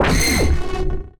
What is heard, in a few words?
cyclops